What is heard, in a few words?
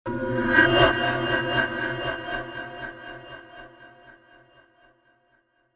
horror